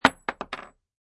Bullet Shell Drop Rifle Solid Wood, empty Rifle shell falls on solid wood